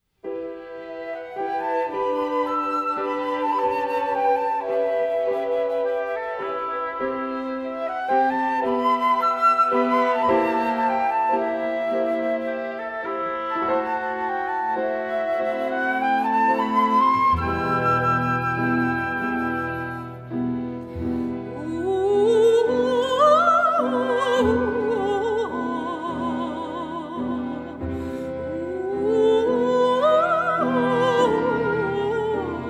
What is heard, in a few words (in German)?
Vokalise